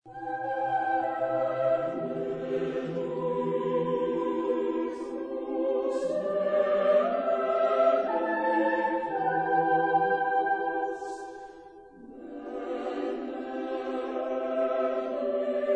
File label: Mass in G Major Benedictus; Poulenc; chorus